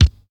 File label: 80s dry reggae bass drum single hit d sharp; kick